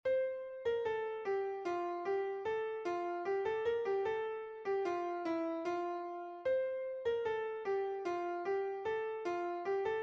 Melodia Flauta Doce; Deck The Halls

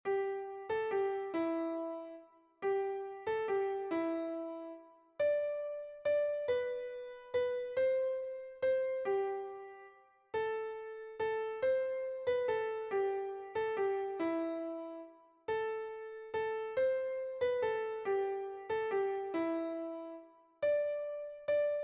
Noite de Paz Melodia; silent night

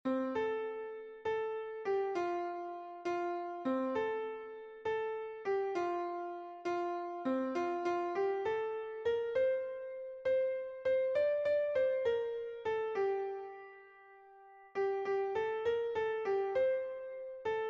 Melodia; fim de ano

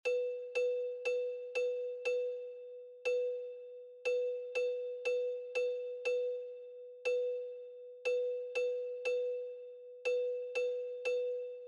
Si Sino Siso Melodia

